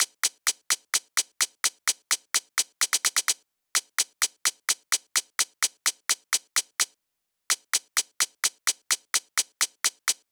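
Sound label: Loop 128BPM hi-hat; VEC5 Drumloops; VEC5 Multi Loops 128BPM; Loop Elements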